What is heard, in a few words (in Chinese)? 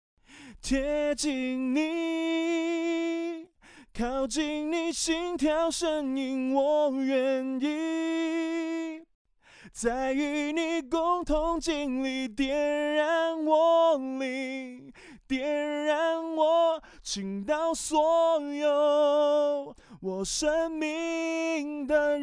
深情男声清唱无伴奏